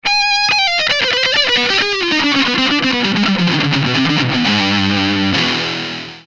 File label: marshall solo